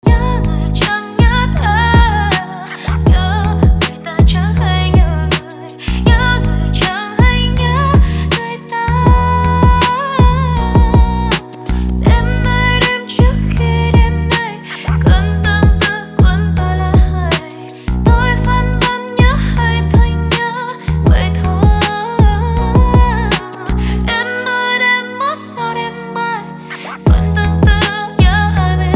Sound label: hiphop